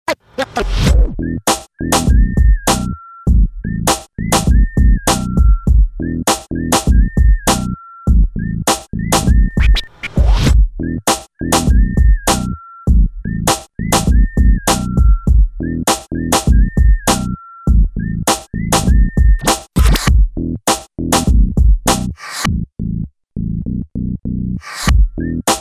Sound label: bed slow n thumpy